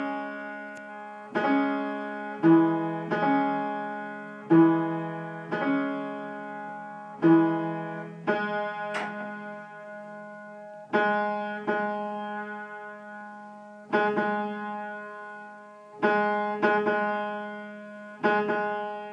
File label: the piano tunist at work